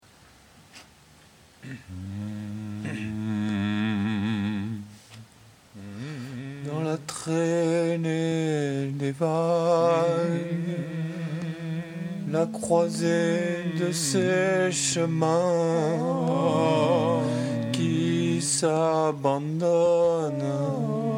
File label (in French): Dans La Trainee Des Vagues